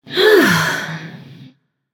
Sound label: sigh; smite; voicepack; persephone